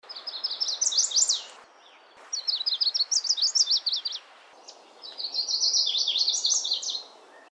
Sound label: WARBLER CHESTNUT SIDED, birds; species/birds; wbba